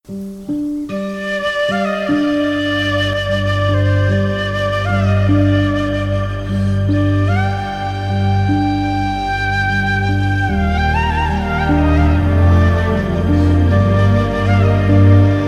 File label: Emotional Sad; Narappa